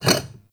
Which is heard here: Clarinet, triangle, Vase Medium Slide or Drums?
Vase Medium Slide